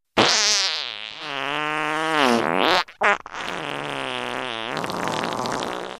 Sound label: Fart